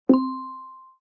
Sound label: mic open chime